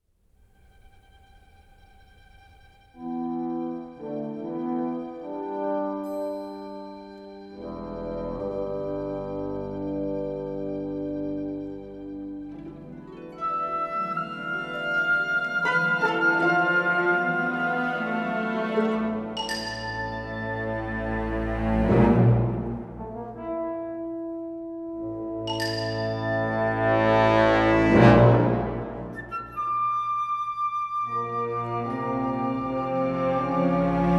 outdoor explore day; xardas

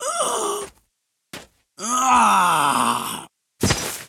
Heroloin Death

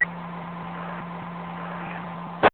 Police Radio Effect Long; This Is the Police 2

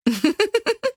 Laughing

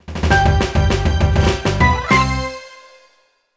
Sting; active health with carol vorderman; nds

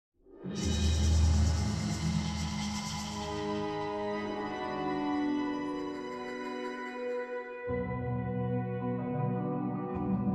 Reverb; Return to Monkey Island

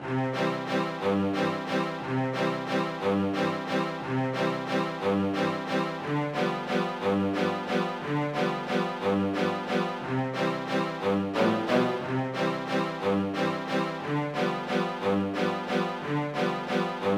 Opera Stage Karaoke; pinky street kira kira music night pop town gamerip

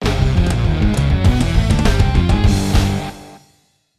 Sting End Of Level; X-Men Origins Wolverine; NDS

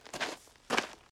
Footsteps Dirt; Idle Champions of the Forgotten Realms

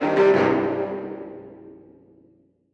Level Failed Short; Jim is Moving Out

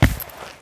land carpet

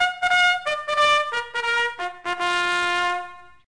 Retreat; Civilization Revolution

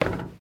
Footsteps, Human, Metal, Run, Isolated Steps; Backrooms 2D

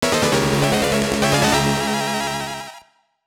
Surprise; Metal Gear

